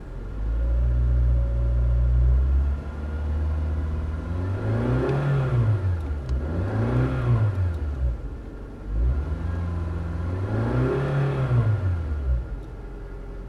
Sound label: Car engine inside cabin